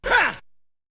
Vocal; ZERO G - Datafile DISC 1

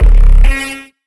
Bass Impact; neuro; BIG5AUDIO

